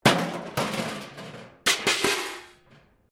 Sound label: metal rubbish bin lid smash concrete